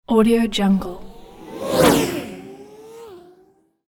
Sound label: turbo race pass by